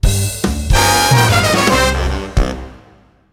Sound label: Swing Police